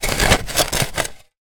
industrial shovel movements twist in dirt soil; zapsplat

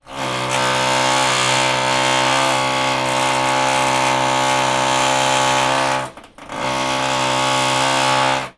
industrial drilling wall floor below

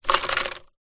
food popcorn handful drop into metal bowl

